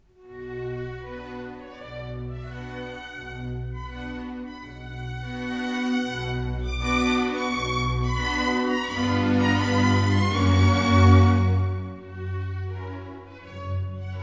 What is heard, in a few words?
Verbunkos; Tempo di verbunkos